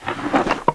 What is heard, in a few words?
WOODEN BOX CLOSE